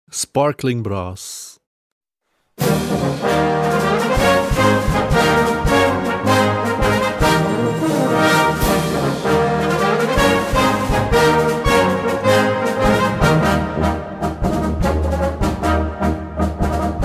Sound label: Sparkling Brass; Band Press